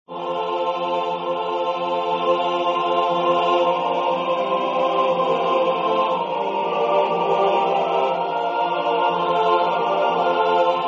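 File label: tutti double choeur; 7Paroles; Pater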